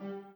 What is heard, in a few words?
b piano